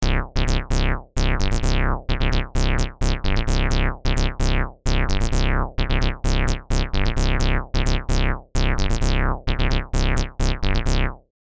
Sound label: bass